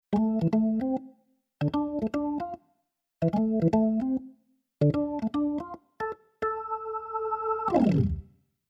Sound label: Keyboard Organ Jazz Organ